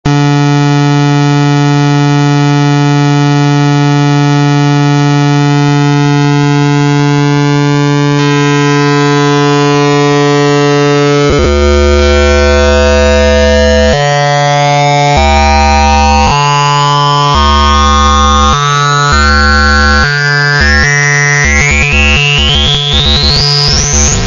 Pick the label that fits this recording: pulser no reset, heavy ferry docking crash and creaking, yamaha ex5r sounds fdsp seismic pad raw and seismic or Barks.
pulser no reset